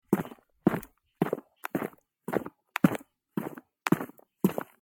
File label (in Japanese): スニーカーでコンクリートの上を歩く音; sneaker